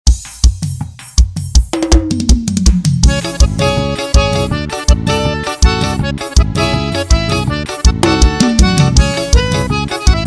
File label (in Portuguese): frevo